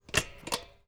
cambio marcha, YBR125

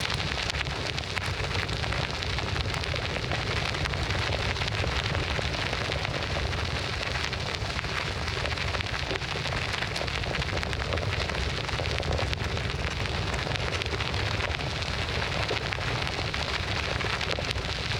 RODADURA EARTH YBR, YBR125

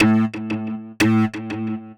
PUNTO CIEGO; SIMUMAK; SIMUZENSOUND

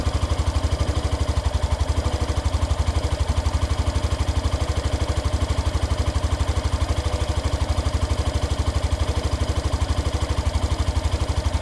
ENCENDIDO LOOP YBR, YBR125

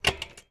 cambio marcha, YBR125

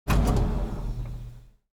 CALADO MOTOR; SIMUZENSOUND

